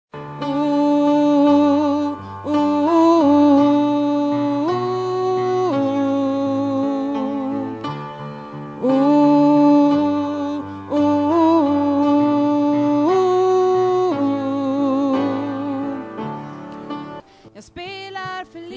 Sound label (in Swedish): bas, stammor